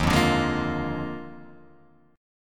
Eb Major 7th Suspended 2nd Suspended 4th down Guitar Open F; strummed; EbM7sus2sus4